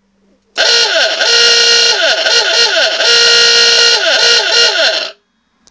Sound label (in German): oldtimer